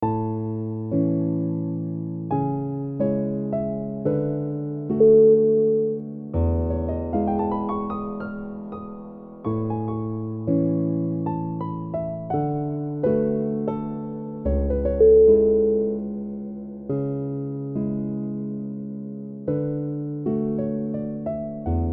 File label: piano version